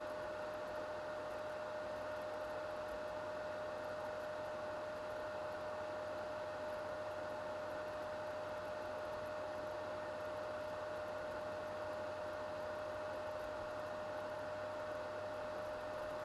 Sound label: Cctv Camera System In Op